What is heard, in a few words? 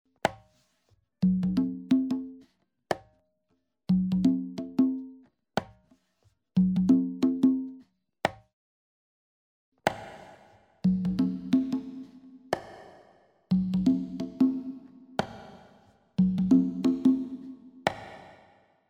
High Modulation